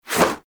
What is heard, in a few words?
Melee Whoosh; Warframe; Sentients; Brachiolyst